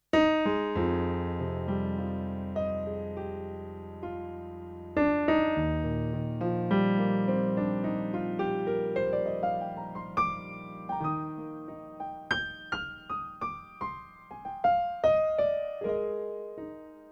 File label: Mellow Piano